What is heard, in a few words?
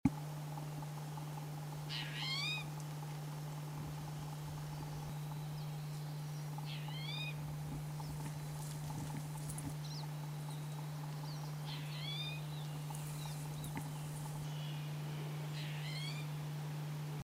towhee Cat Call, birds